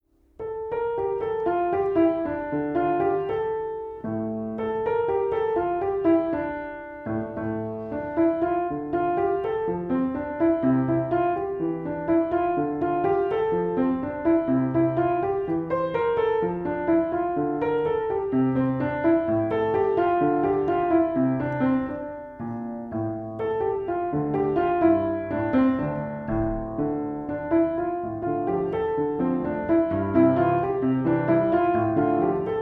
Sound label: Hornpipe; Murray Harbour Hornpipe